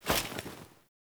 Female Foley Action Default Melee Heavy Swipe, Default Clothing; Characters; Player